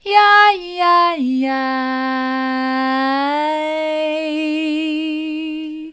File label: yi yi yi mid, vocal